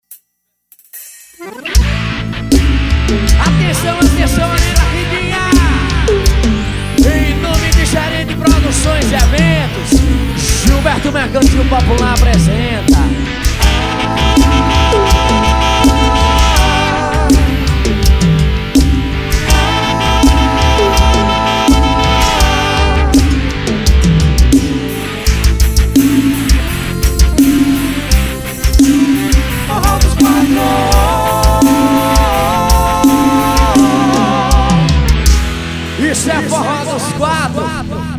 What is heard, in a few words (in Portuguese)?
abertura forró promocional ao vivo